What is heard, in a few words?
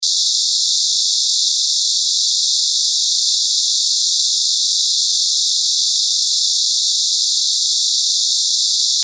White Noise